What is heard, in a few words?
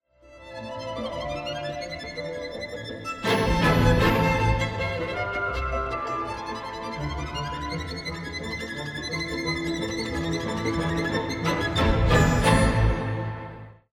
lost round; music kits custom